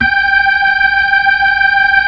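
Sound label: B-3, Hammond B-3 organ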